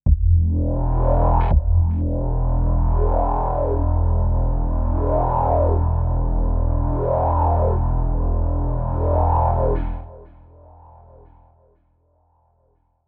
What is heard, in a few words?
Softsynth EQP 100Hz 8kHz; Softsynth5